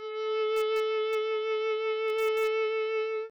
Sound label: viola, vibrato